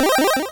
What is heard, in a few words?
Arp, Stab & Hit; Bitkits